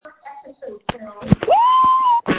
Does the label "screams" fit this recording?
Yes